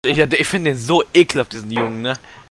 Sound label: jingle